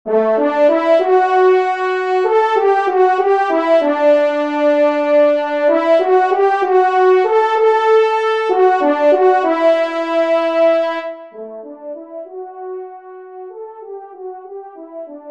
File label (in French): trompe; Loisirs du Chasseur; Hymne Foret; Pupitre 1° Cor; Henri Sénée